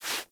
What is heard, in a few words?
Foley Idle Paw Swipe Quick; Warframe; Kubrow